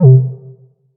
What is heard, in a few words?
Shield Down